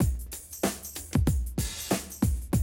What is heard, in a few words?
Classic DrumLoops